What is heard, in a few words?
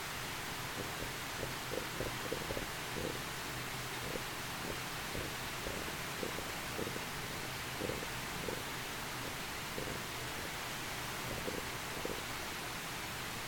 fraser delta fish sounds